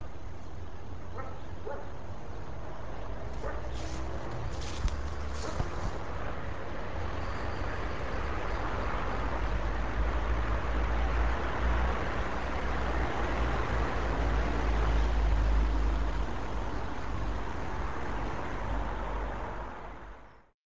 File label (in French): bruits